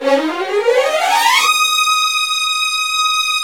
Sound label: STR UP, Vlns Bow FX, Runs; Roland - String Master Series; Vls Runs